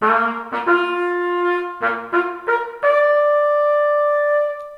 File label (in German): laut treiben, jagd